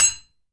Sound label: IRONWARE, IRON WARE HIT; 1000fx